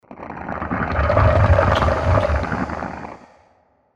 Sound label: Horror Growl